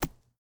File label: arrow dirt, attack impact